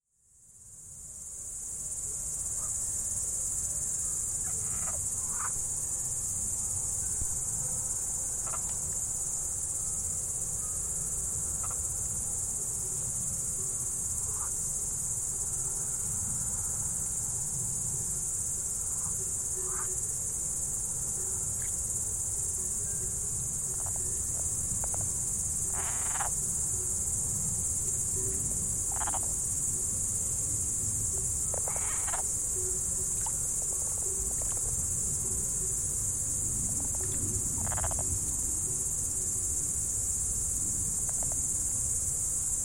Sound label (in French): grenouille